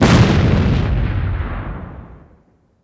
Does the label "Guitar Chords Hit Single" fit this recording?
No